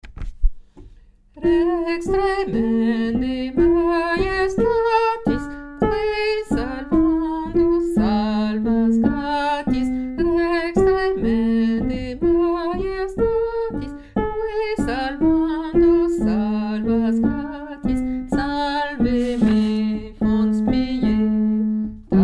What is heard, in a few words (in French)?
lettre ABCDEF basse; chorale